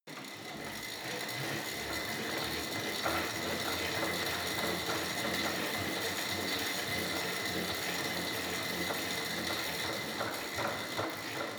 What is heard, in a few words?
indoor bike sequence hard, fitnessstudio; sport freizeit